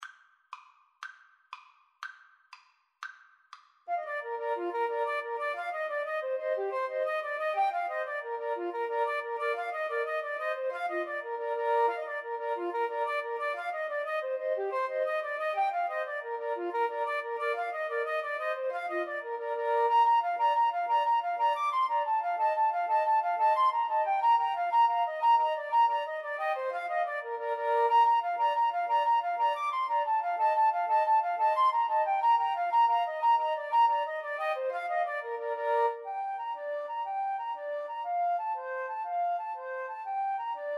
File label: irish jig medley, 2 Flutes Clarinet